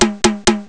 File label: klong; Amiga Soundtracker Sample Packs